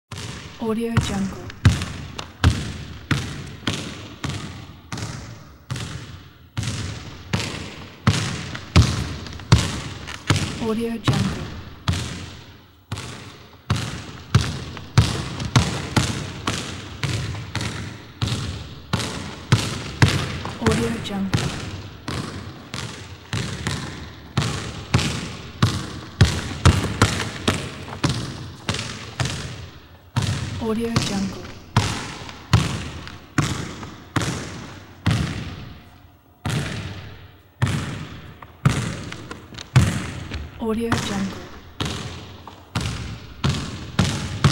basketball bouncing in large gym

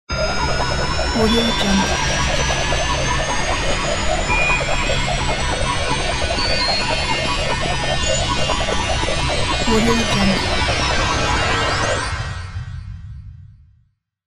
ufo

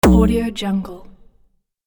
wrong

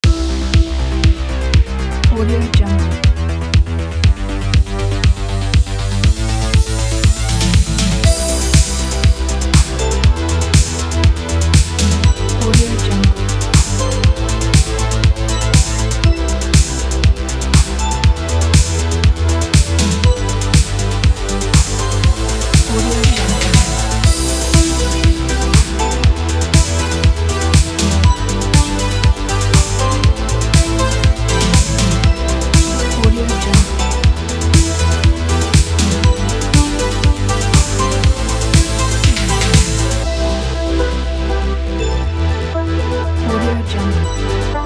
80s retro kit; deeflack92

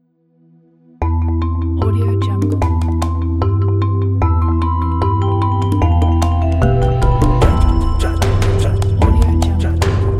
cinematic balinese gamelan

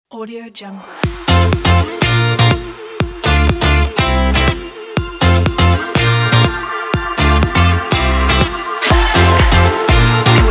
energetic fun summer pop party

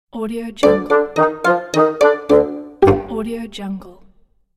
cartoon fail game over

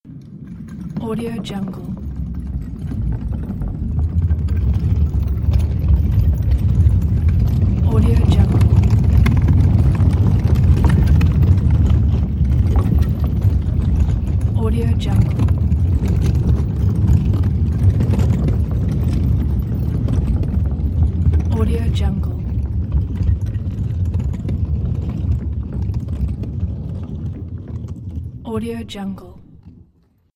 earthquake

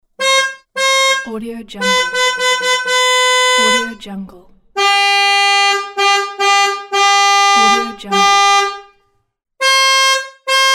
car horn

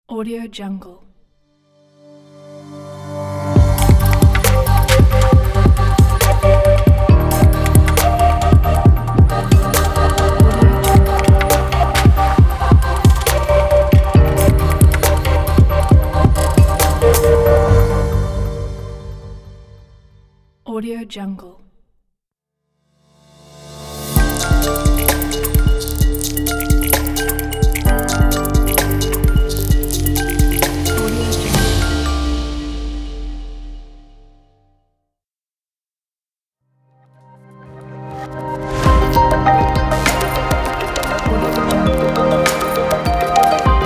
abstract glitch logo intro pack

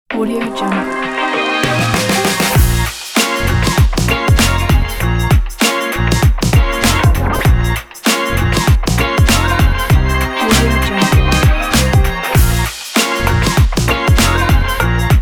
hip hop

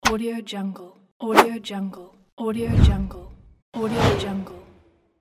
woosh

